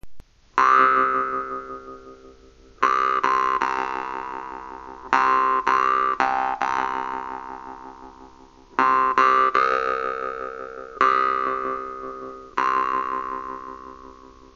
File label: vibrato